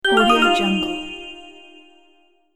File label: cartoon magic wand